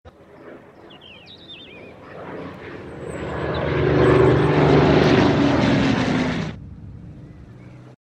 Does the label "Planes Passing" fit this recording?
Yes